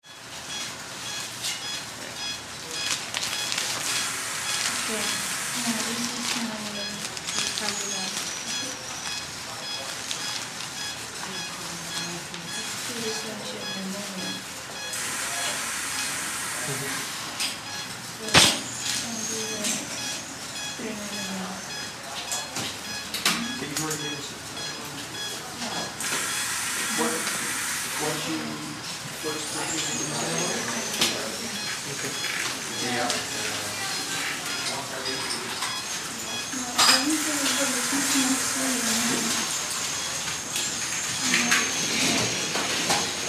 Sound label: HOSPITAL EQUIPMENT HOSPITAL: Operating room, general atmosphere with voices & heart monitor.